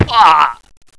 player fall pain

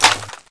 m203 close, weapons; sci arms

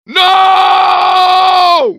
death, grunt

